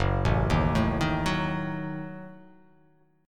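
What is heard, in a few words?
Gb Minor 6th Gb, 8 string; arpeggiated; JGuitar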